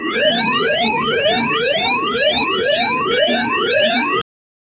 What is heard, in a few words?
space heroic sound